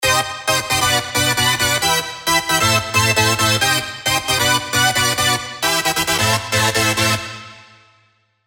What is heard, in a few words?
rave stabs; Rustborn; revealed spire rave stabs